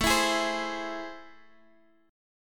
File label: A Minor Major 7th A, Guitar, Half Step Down